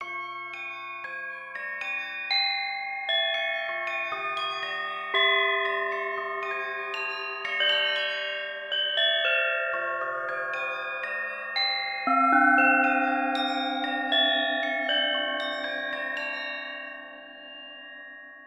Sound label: ethno world 5 velocity; best service